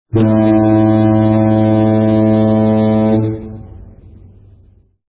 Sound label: foghorn; daniel simon